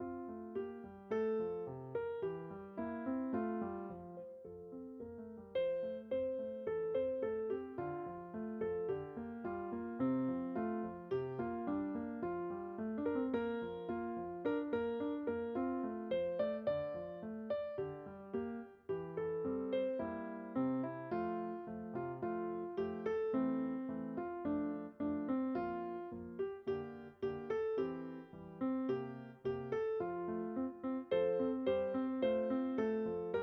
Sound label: Cau Cho Cha Me EPiano; Ver 2: EPiano; Vietnamese Arrangements